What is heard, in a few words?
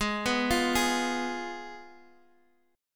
G sharp Minor 6th, Guitar Open D; x,x,6,5,8,6